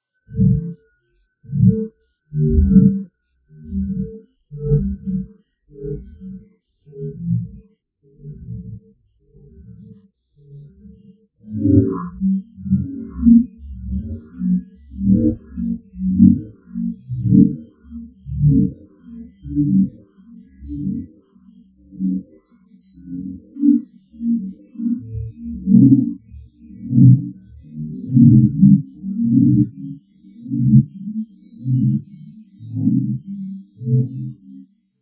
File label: bench collapse; hearing the unheard